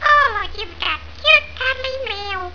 cute mail

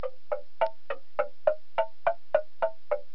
indian drumloop

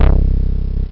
synthesizer

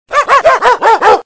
dog bark

